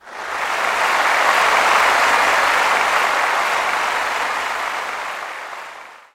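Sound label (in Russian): аплодисменты на поле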